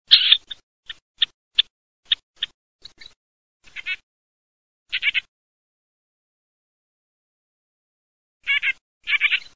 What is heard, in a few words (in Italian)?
COMBATTENTE, birdsound